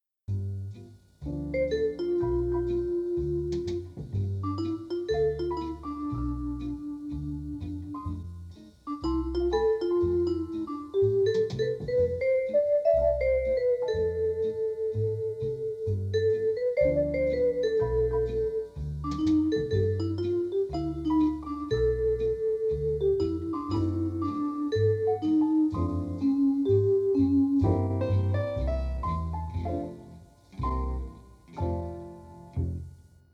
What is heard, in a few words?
Mellotron Swing